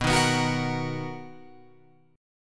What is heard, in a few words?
B Major 7th B down Guitar Open F; strummed